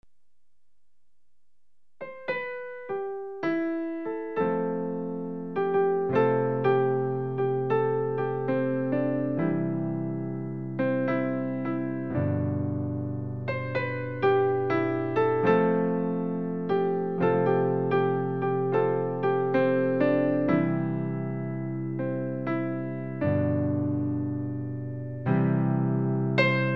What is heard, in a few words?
Piano